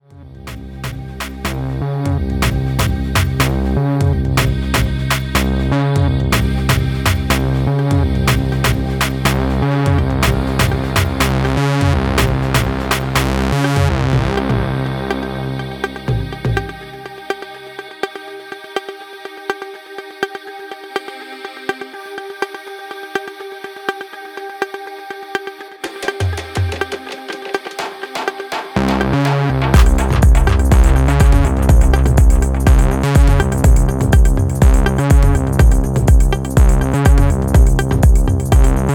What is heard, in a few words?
African techno dub